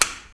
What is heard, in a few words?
button